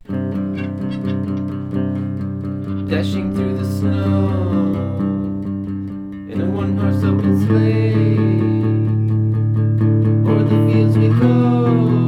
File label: jingle, christmas Music